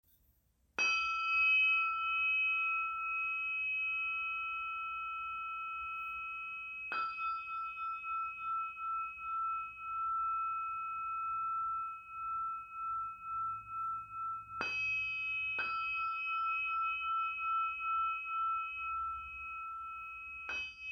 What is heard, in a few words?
crystal pyramid